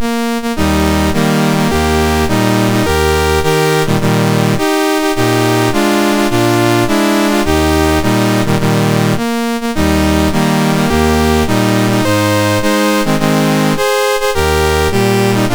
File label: Costa Rica, National Anthems; MOS6581R3; Dave Marquis